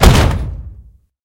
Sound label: metal impact; tanks; War Thunder